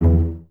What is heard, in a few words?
CES E 2 Pizz, Cellos, String Ensembles; Miroslav Vitous